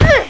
F Small Impact

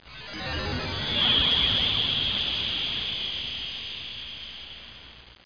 Creepy

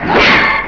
Shield; Crystal Caliburn™ DEMO

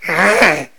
hurt; Vrtulnik

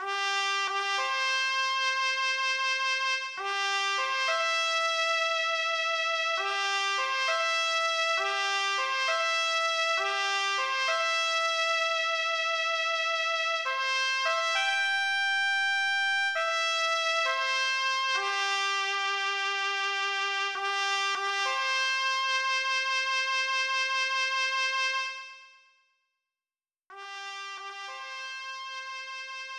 Taps; Spades

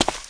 fs stone; Spearhead Demo; MacFormat 2003 June

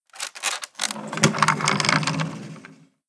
chariot factory; Immortal

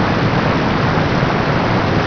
SURF NOISE; Driver; CD Expert 39

